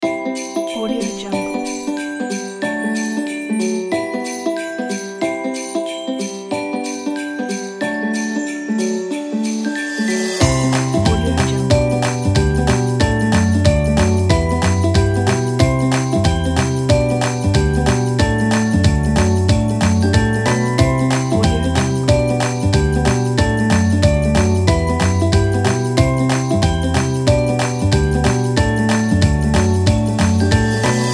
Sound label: christmas kids